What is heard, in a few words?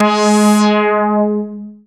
SYN Resotron; Best Service Gigapack 1